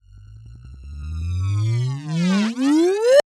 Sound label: Laser Charge Up; SoundsCrate